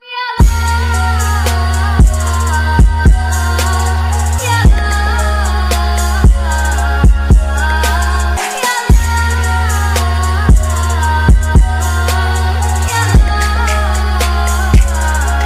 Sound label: Mood Off Ringtone